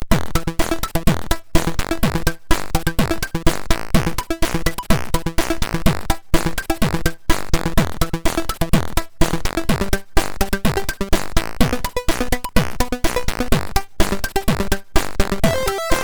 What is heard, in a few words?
Circadin tune, MOS6581R4